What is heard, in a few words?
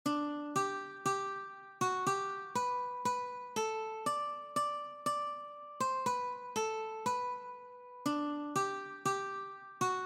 Hark the Herald angels sing Guitar easy version